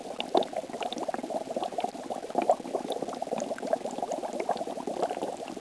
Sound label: boil, Boiling and Bubbling